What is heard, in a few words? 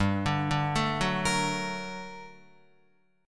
G Minor Major 9th, down, Guitar; arpeggiated; JGuitar